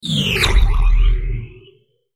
Sci Fi Weapon, Sci Fi Plasma Ray Gun Blast Impact Warble Liquid; Battle